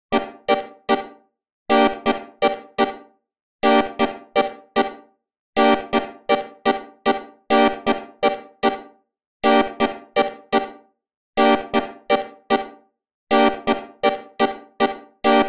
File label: 124 G Synth, Complex Electro Synths; Prime Loops